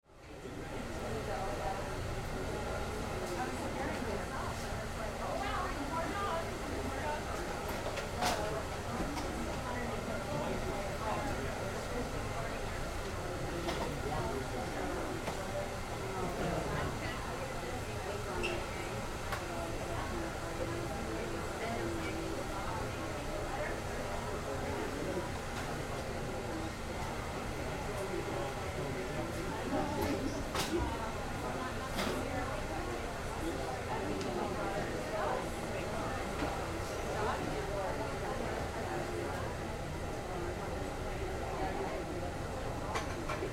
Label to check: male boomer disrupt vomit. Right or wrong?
Wrong